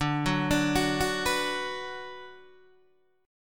D 6th D down Guitar Open D, chord

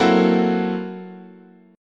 F sharp 7th Sharp 9th Flat 5th, chord

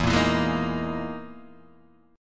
E Major 7th Flat 5th down Guitar Open D

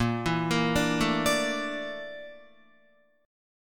Bb Major 7th Flat 5th, Guitar; 6,7,8,7,9,9; 4E,4A,5D,5G,5C,6F; arpeggiated

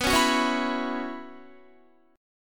B Suspended 2nd Suspended 4th, Guitar; strummed; 4E,4A,5D,5G,5C,6F

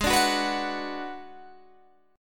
Ab Minor 7th Flat 5th Ab, down, Guitar, Open G

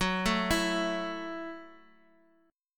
F sharp Minor 7th F sharp, Guitar Open D

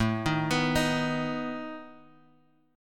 A Suspended 2nd Flat 5th A down Guitar Drop D

